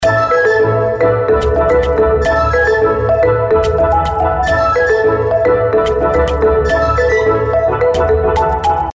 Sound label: Celebration, ringtones; Acer Liquid Z410T Telcel